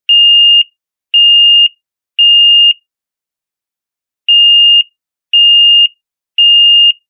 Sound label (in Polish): ton